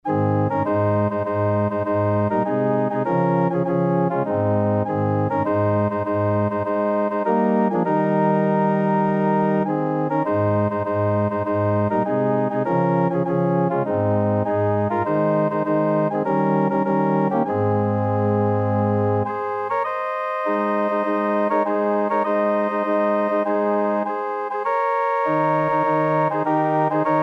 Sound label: organ